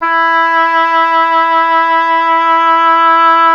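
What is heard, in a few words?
oboe, wind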